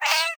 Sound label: ocelot hurt; Minetest; VoxeLibre; mobs mc